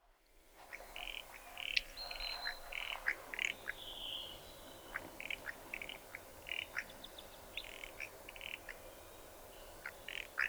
Tannenhäher Gesang

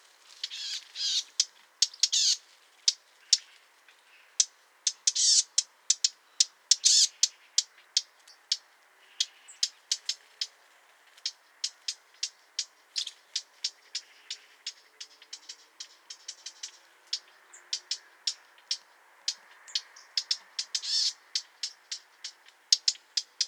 Mönchsgrasmücke Erregung

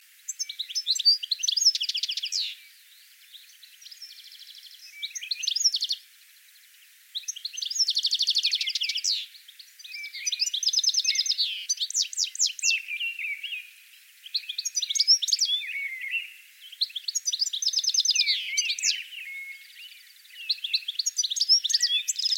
Stieglitz Gesang

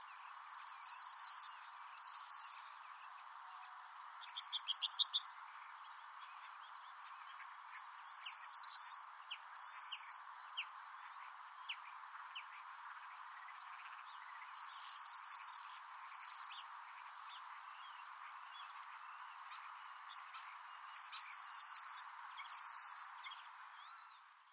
Goldammer Gesang

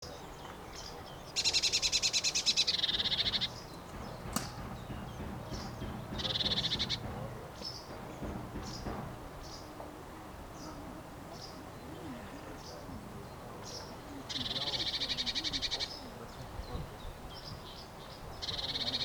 Blaumeise Alarmruf

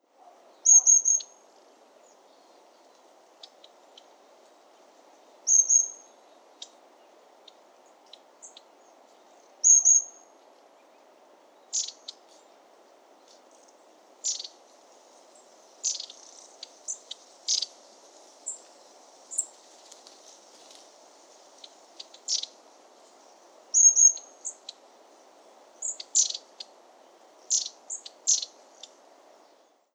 Schwanzmeise Gesang

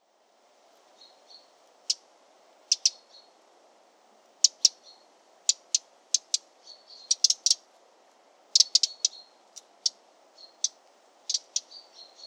Zaunkönig Stimmfühlung

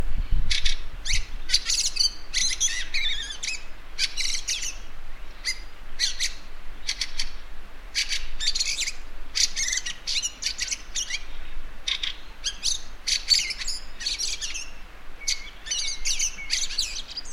Wacholderdrossel Gesang

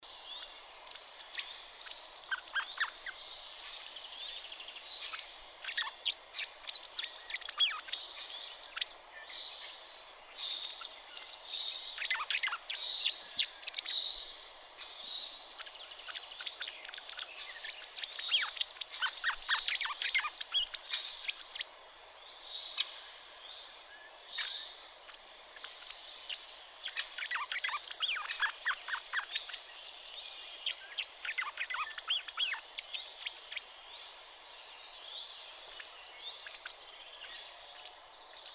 Bachstelze Gesang

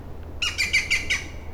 Grünspecht Alarmruf

